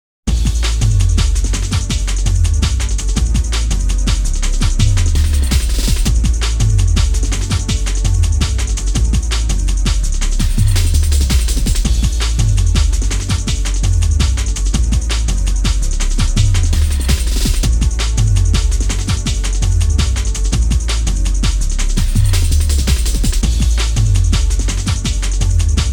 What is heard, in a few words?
Goal In; 1080 Snowboarding